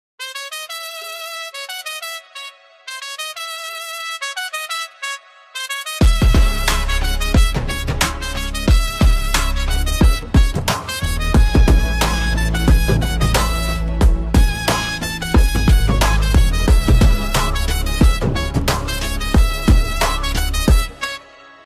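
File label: background music ringtone; king title reveal; shah rukh khan; new bollywood bgm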